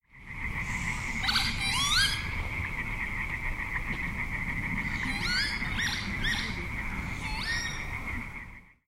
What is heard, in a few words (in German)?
Kiebitz Vanellus vanellus